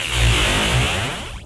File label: Thunder